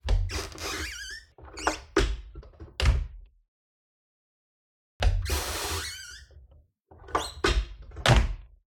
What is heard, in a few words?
Wardrobe Door Closure Action Multiple; Closets Small Doors; SoundFxwizard